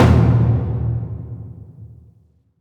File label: Taiko Drum; Warframe; Dojo Props; Taiko Drums